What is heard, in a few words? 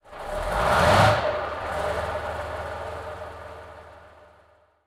t90a engine start, tanks; War Thunder